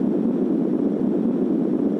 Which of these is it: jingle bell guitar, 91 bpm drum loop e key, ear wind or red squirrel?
ear wind